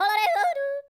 YODEL, World Voices; ILIO; Vocal Planet

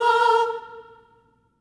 STAC M A#4, staccato, voices; Spectrasonics; Symphony of Voices

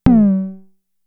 Tom, Toms; Fricke Schlagzwerg; Drum Machines

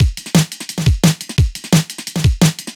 DNB BREAK; m8 breaks

